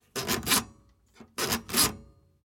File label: front roller hits